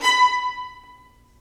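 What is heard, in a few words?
violins marcato, String Sections; Roland LCDP13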